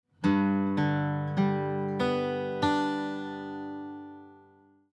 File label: arp abalone